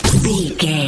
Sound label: sci-fi weapon blaster laser boom small